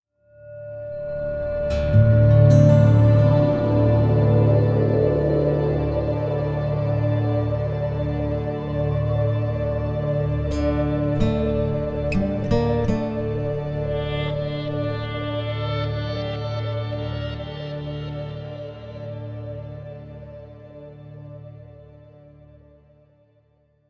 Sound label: Slow Open; homefront gamerip 2011